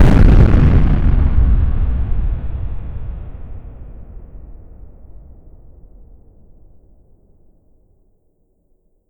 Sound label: atomic bomb explosion